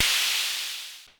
KLONE NOIZ LNG, Klone Dual-Percussion-Synthesiser, HHAT; Drum Machines